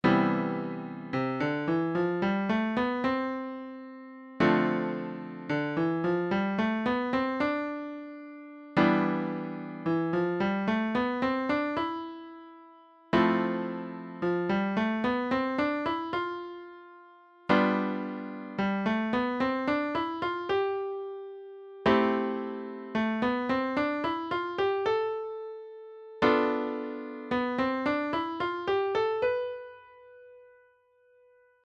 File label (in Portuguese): Audio Diatonica